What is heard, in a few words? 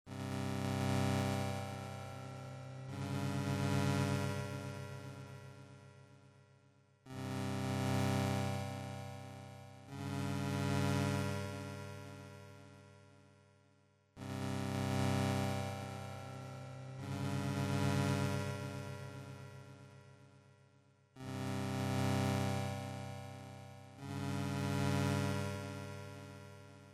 Ambient Dread; For a Vast Future